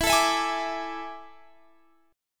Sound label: A Major 7th Suspended 4th E